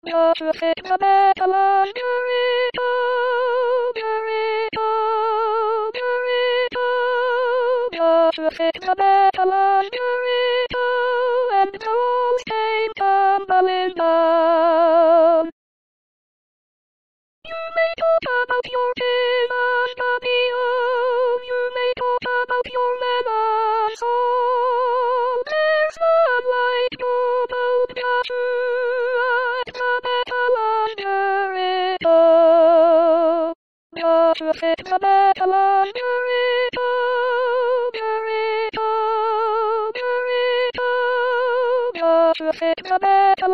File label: Joshua Soprano staff